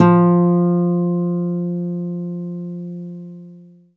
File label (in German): KONZERTGIT, Alpine Volksmusik